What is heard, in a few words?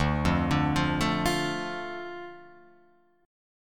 D Minor D Guitar Open F; Dm arpeggiated